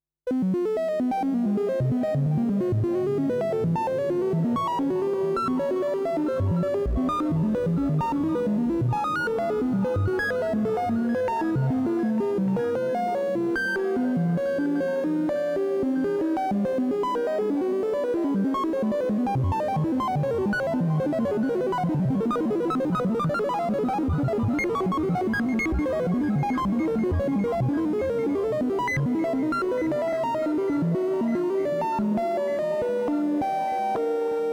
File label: MS20 random